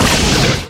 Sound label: spell effect; wizard incantations; Flame spell